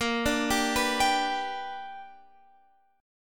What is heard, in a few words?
B Minor Major 7th Sharp 5th, chord